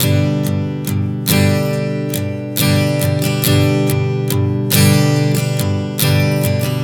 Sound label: Strum 070 G, One Chord Strumming 070 bpm; Acoustic Guitar; Sonic Foundry (Sony Creative Software); Songwriter Acoustic Guitar Companion